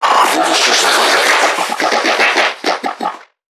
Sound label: Creatures Vocalisations Infected; soma; npc construct; Five Nights At Akers